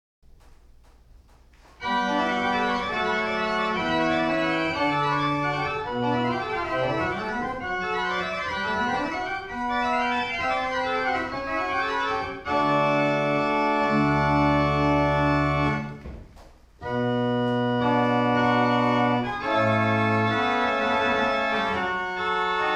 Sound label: Toccata ex G Dur; D Buxtehude